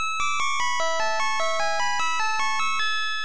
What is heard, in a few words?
Music Box; labyrinth maou no meikyu family computer